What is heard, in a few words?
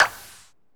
SE GAME GOOD; maimai